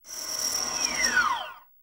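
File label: Fast Whine Down Cartoon, servos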